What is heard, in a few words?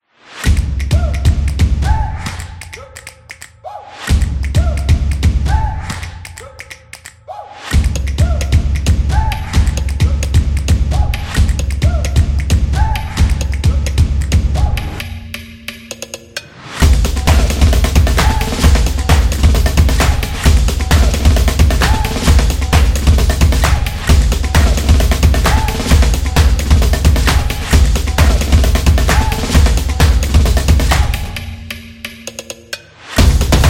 Percussion Dynamic, samba